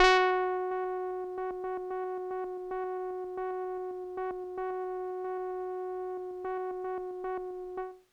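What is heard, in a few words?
Kawaii F#3; keys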